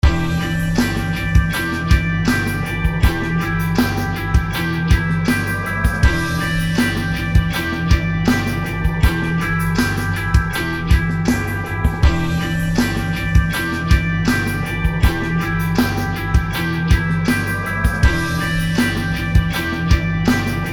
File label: Rock Loop; 8d sample pack genres